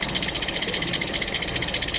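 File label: kappa spin loop; smite; kuzenbo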